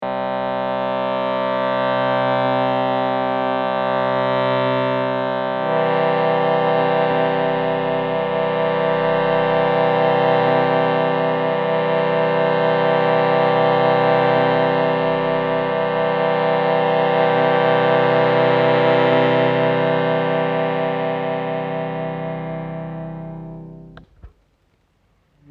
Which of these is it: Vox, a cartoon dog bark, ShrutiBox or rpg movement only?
ShrutiBox